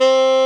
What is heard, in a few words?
SAX XSAX, Saxophones; All Saxes